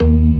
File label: CLASSIC C3, Synth and Organ; InVision Interactive; Keith Emerson; The Most Dangerous Synth and Organ